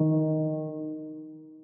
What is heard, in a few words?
epiano dark d#3; gamerip; lofi room demo